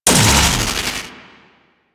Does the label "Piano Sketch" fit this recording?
No